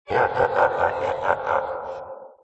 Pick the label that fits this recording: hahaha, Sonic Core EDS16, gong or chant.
hahaha